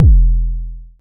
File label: analog tonal, Kickbank